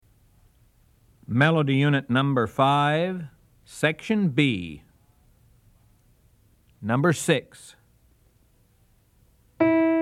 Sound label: melody, ear training recordings